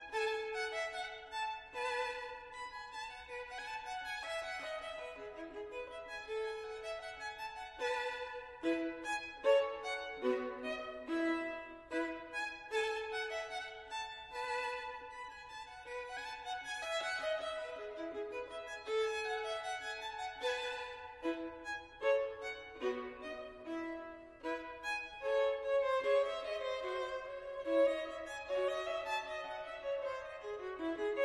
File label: Gavotte en rondeau, Violin